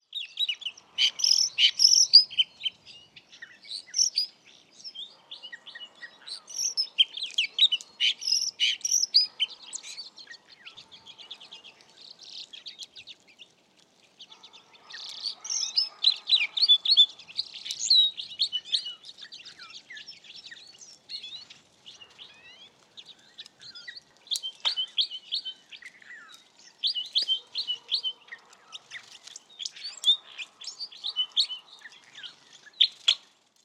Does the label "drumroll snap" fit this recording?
No